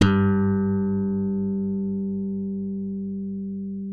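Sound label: left thumb G2, slap thumb; Xsample VOL-1; 90 s Sample CDs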